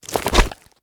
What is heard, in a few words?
Swamp Jumper Escape; we are the dwarves gamerip 2016